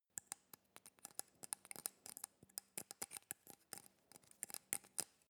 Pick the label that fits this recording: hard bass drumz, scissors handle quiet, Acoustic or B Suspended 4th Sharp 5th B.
scissors handle quiet